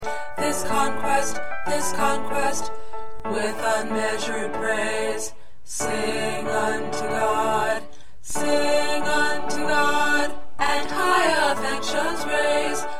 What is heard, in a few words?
2nd Tenor